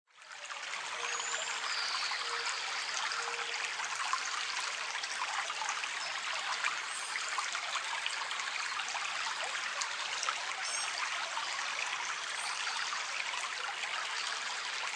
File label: Birds By The Stream, Light stream, brook or creek running through forest with subtle sounds of birds; singing cheerfully; LynneMusic